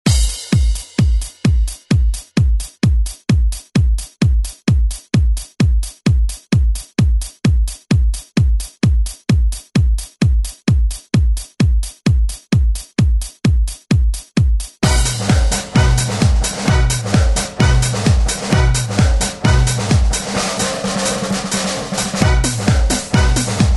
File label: 90s ReDrum; DJ Mhark